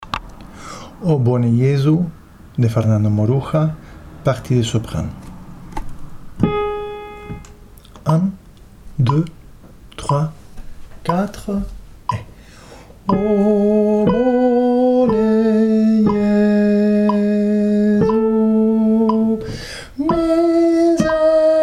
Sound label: O bone Jesu soprano